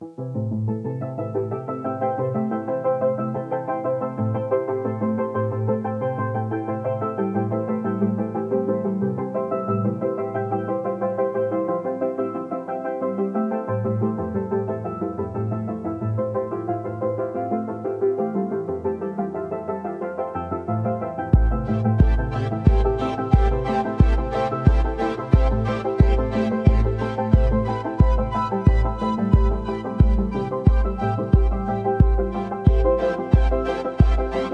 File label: Chillout Vibes